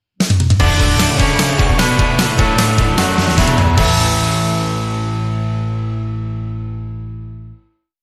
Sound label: Jingle Jingle, Sting